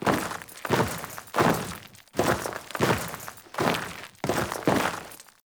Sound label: Skiff Crash Foley Steps; Cinematics; Kahl